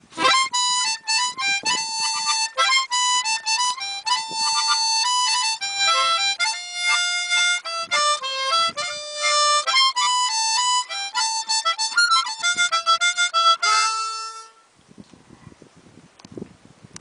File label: Harmonica